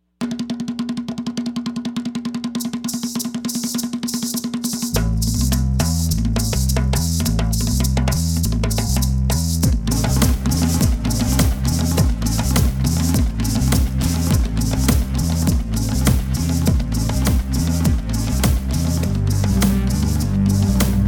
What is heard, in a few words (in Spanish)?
Sabo y Tambo